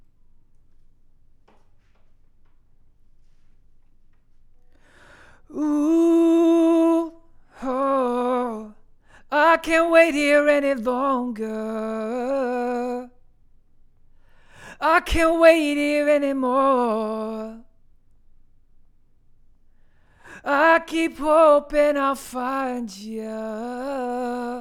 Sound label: Vocal